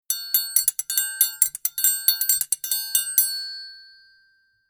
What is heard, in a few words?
son triangle